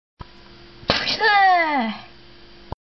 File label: Spit